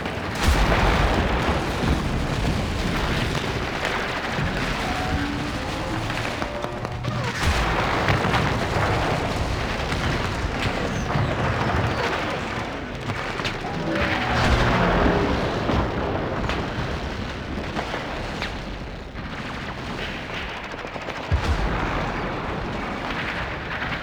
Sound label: Ambience; Warframe; The New War; Cinematics; Grineer Eidolon Ending